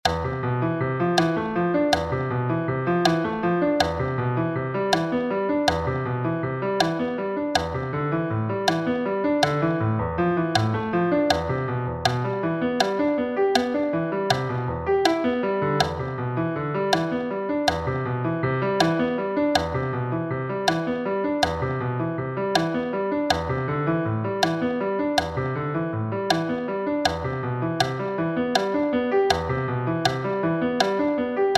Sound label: Click 80